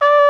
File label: FLUGH, Orc Brass; Club-50 - Foundations Roland